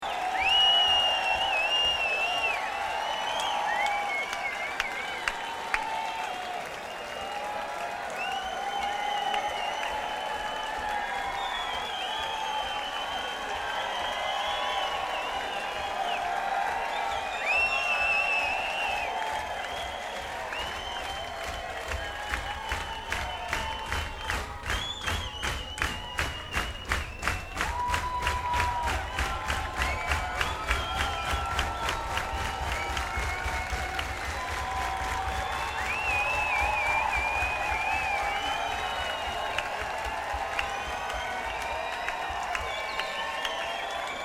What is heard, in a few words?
Crowd; Toronto, ON, Canada; Concert Hall